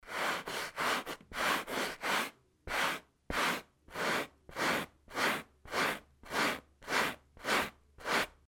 zvuk shhetki

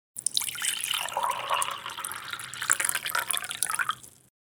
zvuk nalivanija vody v stakan

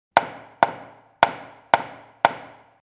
zvuk molotka

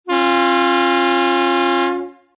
zvuk signala mashiny

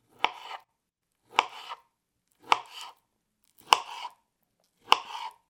zvuk nozha

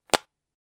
hrust palcev

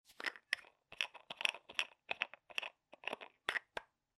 kryshka tjubika

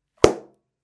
knigi zvuk

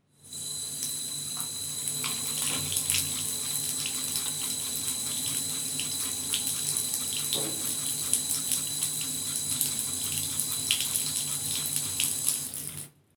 zvuk krana

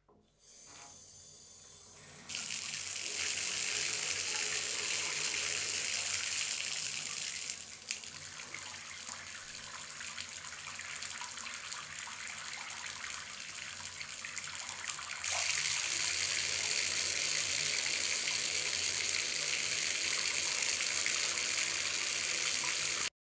zvuk krana

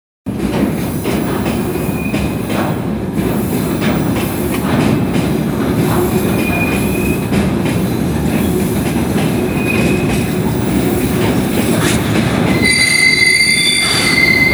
zvuk poezda

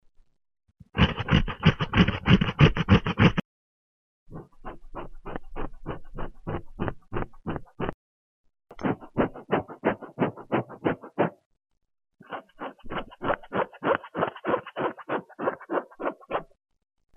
skrebushhie zvuki

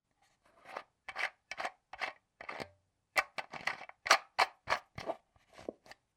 zvuk kryshki

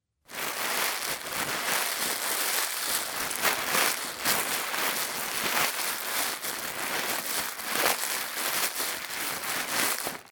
zvuk paketa

